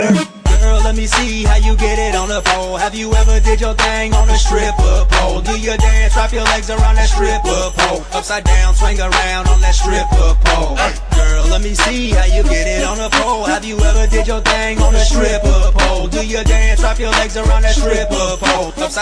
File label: stripper music